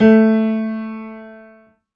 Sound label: Piano, Harmonic